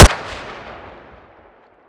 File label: mac10 fire